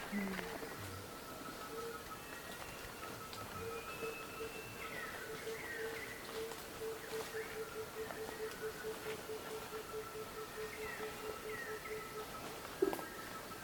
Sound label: pitta